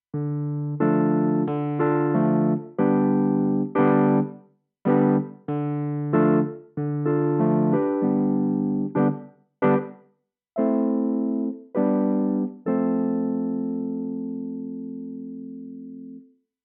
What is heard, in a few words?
JDK Audio EQ; Reed 200A